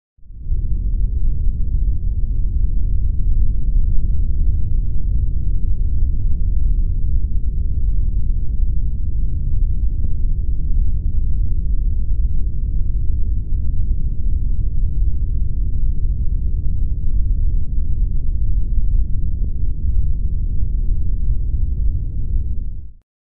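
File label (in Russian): движение лодки под водой; Звуки подводной лодки; звук движения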